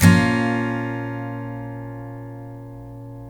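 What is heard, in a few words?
steel A# minor down, CHORDS STEEL, Guitars; Spectrasonics; Hans Zimmer Guitars VOL-1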